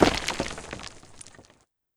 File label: Rock Hit